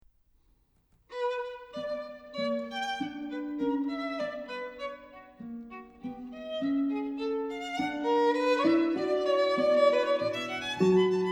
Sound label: Sonata for Flute and Keyboard; Presto; J.S Bach; 14.Presto; Sonata in B minor, BWV 1030 for Flute and Harpsichord